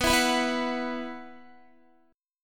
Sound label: B Suspended 4th, Guitar; Bsus4 strummed; JGuitar